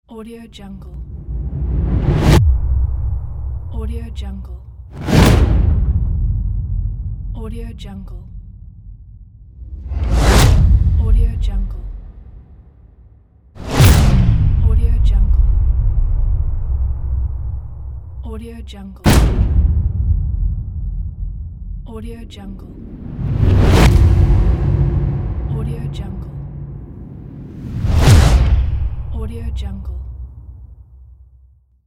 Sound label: cinematic impacts pack